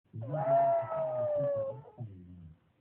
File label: Yorkie howl